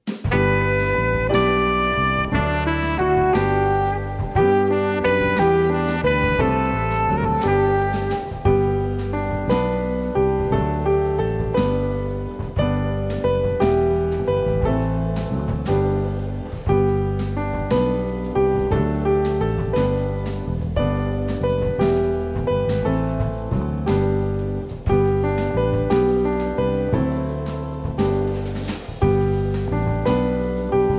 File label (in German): seht es naht die heilige Zeit playback, Lieder